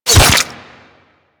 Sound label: Bullet Flesh, Impacts; Warframe